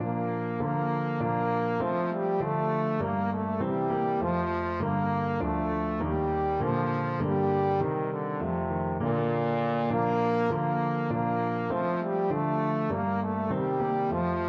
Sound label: trombone